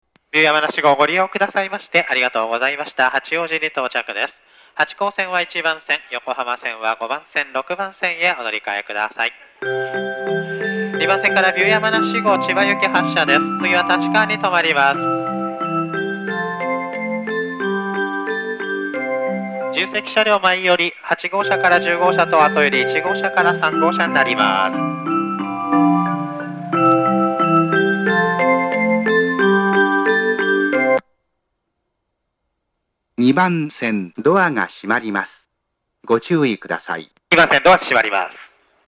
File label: hachioji melody; 発車メロディ; 中央線快速電車 国分寺・三鷹・新宿・東京方面; 中央本線 立川方面